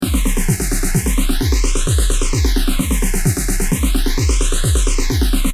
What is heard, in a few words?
drum loop phase 100